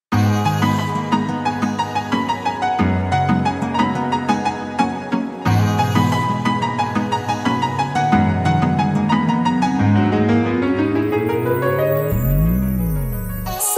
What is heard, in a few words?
instrumental music ringtone